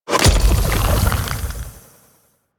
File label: Crafting Build; Warframe; Deimos